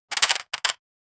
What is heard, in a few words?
one shot sound of rusty, armor